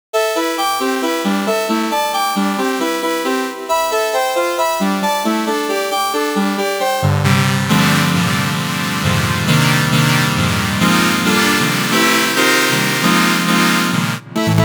SawSine PowerPad

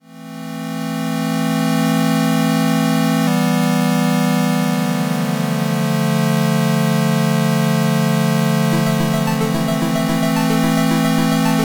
NES; NES Pack

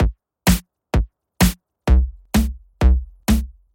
ez drums

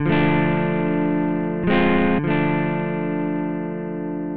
strummed, Strummed Guitar Chords; Carbonthief

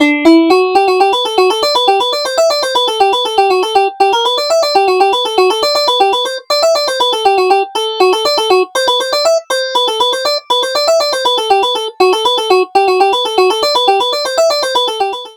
dist banjo, synthetic banjo; Gova

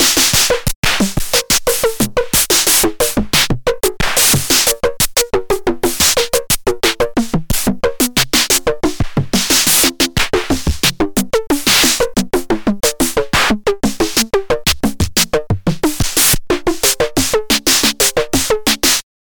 XB09 Snares Dry, Jomox XB-09; Danoise

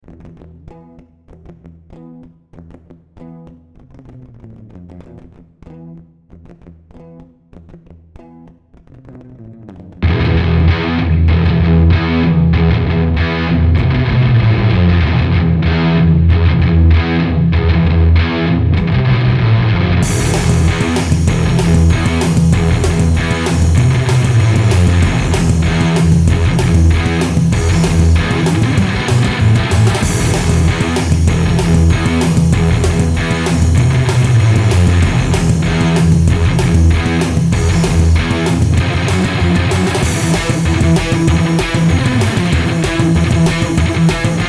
Metal example; Thebellows

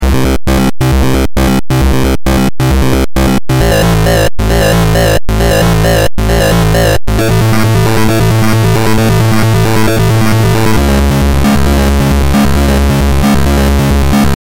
Synth MonoSwitch

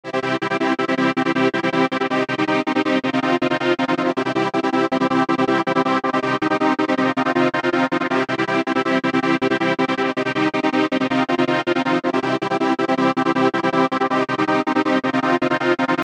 TranceGate; Doofers; Thebellows

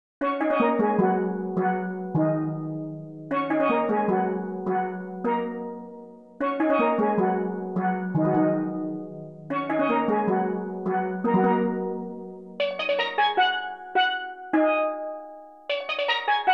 steeldrum

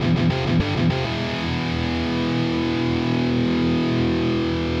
Power Chords Std Processed, sample; Carbonthief

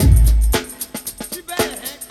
Think Bass

